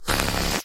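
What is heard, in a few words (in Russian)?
воздушный шар взрыв одиночный короткий близкий пердящий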